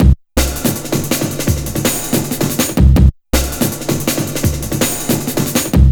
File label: 162, breaks, Loops; M8